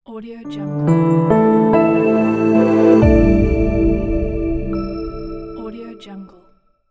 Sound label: branding logo; warmwolf